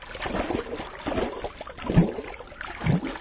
swim underwater loop

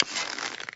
Bow Draw

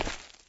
Dungeon Medium, shagov

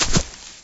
Grass Light Medium, shagov

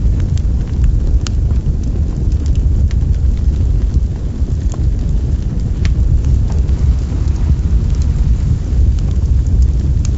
FIRE Loop Medium

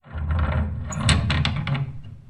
Old Door Creaking; zvuki dvery; Old Door Creaking-A

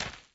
Dirt Light Medium, shagov

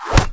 Arrow Impact Leather

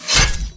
Arrow Impact Chain

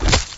Arrow Impact Flesh

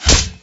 Arrow Impact Plate

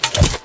Arrow Impact Stone

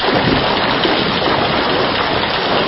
waterwheel

